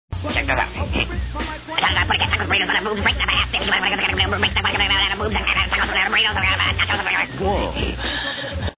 fast rap

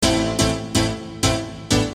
Piano Loop

